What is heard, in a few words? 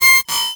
Production Element Title Transition Communication Transmission